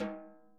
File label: SNARE, Interactive Drum Kits